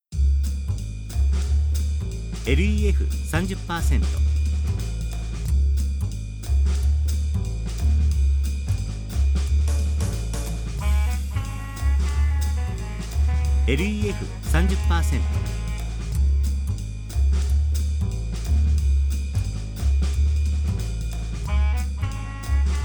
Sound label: jazz